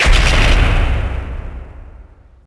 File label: Oven doors slam; cold steel